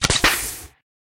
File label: Cut Ropes; Cruiser; Lethal Company